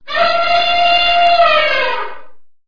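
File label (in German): klingelton elefant tröten sound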